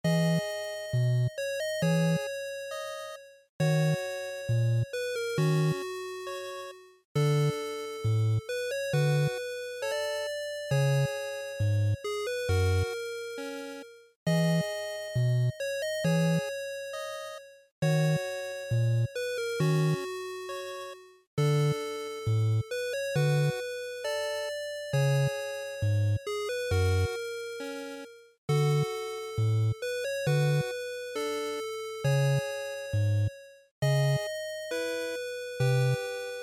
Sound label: NES RPG Field Loop135